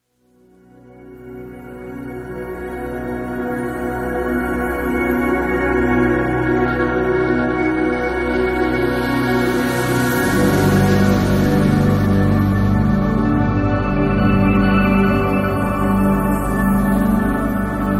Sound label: Local Stars Pad; OMS Sound